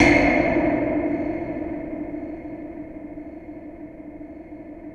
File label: space bass L; InVision Interactive; Lightware VOL-1; Instruments & Percussions; DEMBY